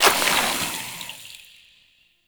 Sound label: Gyromitra Poison Shroom Spore Cast; Warframe; Nokko